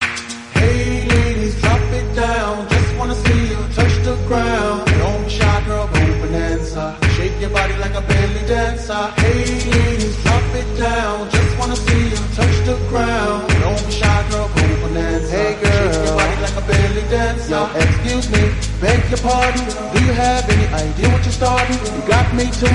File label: slowed reverb